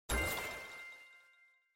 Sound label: win